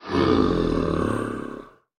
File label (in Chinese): 僵尸村民叫声